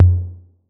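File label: 808 low tom; Wizoo - Platinum 24 Electronic; CHR TOMS 808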